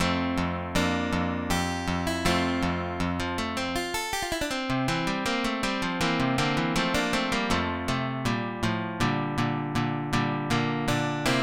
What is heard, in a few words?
Andante espr, guitar